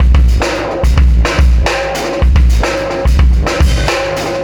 System Overload Beat, Processed Drumkits

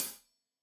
Hat Tight, Hi-Hat, Cymbals; Deeply Sampled Drums

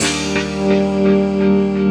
E PIANO 125, Keys; Houseworx

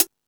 Jazz Drums, E-MU Pro-Cussion; drum machine

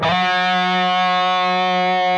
PRS G, PRS LEAD, Guitars; Spectrasonics; Hans Zimmer Guitars VOL-1

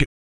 BOOGA BRETH, Vocal Planet Blues & Soul; ILIO

